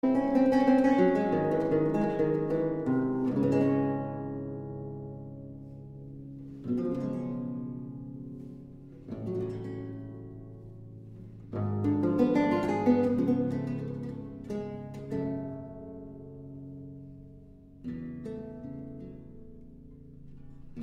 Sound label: Lute Sonata in D Minor, Prelude